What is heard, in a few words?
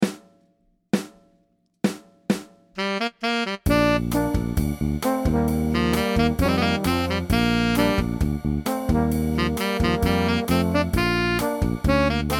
no piano guitar